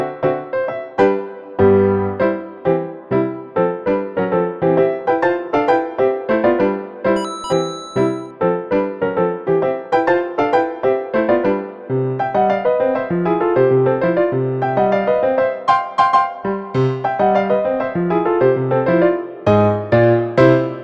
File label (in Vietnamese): khong loi mario mi 9 lite xiaomi